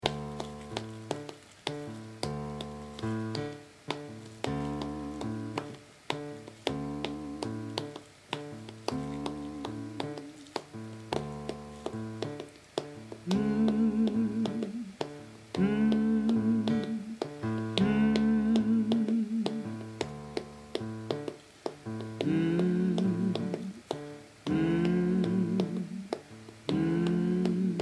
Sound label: Sop bass, singers; Outdoor-Events; Deblozay; Papa loko